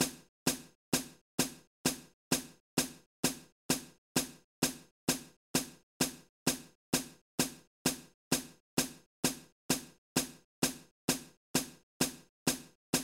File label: Blues Metronome; Chuck E. Cheese's Party Games